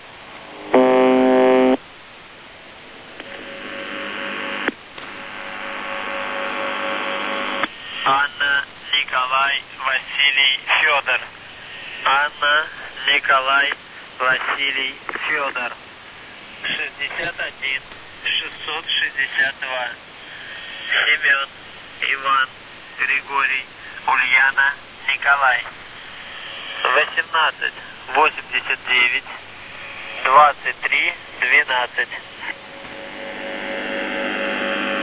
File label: buzzer usb msg